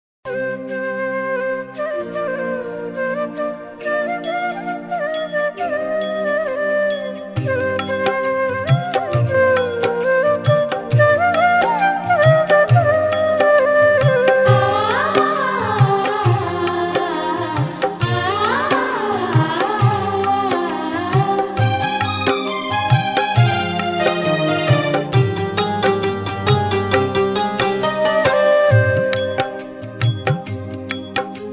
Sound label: Karaoke Music